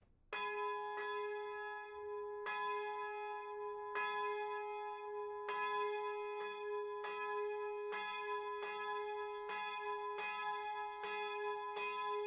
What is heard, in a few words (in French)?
cloche anges et archanges; lab4; 1993; cathédrale de Strasbourg